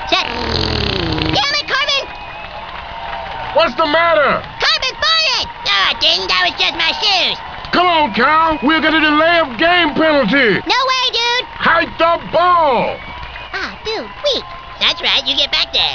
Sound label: cart fart